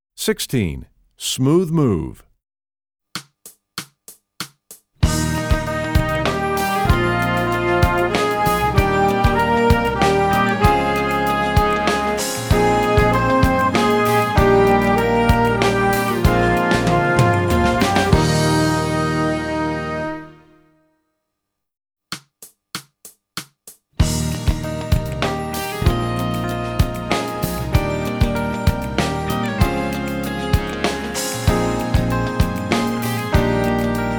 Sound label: trombone; essential elements